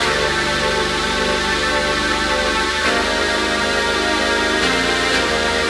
Spooky, Synth Sequences

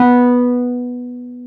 PNO, Wurly

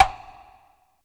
ETH PERC, ASIAN PERCS; Crypton - DrumBase5000

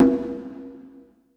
BONGO R; BPC DRUMS

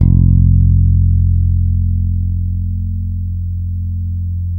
6-STR FUNK, bass; Spectrasonics; Bass Legends

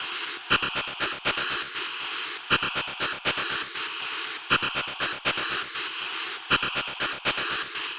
Anabeat Hat; Sonic Foundry (Sony Creative Software); Prototechno

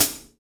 Pop Pedal HiHat

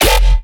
bass hit Schwomp F; Virtual Riot

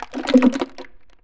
DUMP, household; AKAI Anime Sound FX

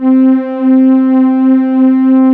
MIX STR C5

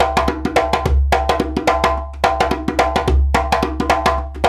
Lamba, African Contemporary

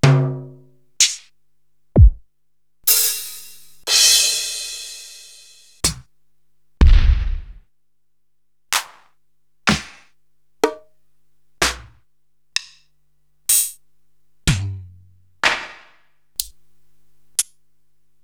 Korg TR-Rack, Samples Sliced; m8

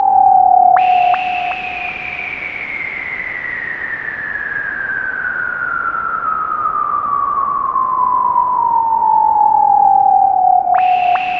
VCS WIND; Zero-G Ambient 1

